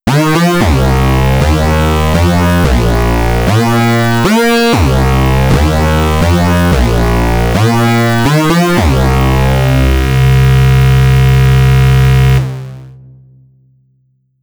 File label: burning reso